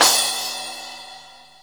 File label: CRASH, CYMBALS